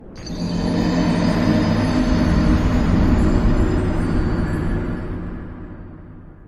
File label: death sound effect; dark souls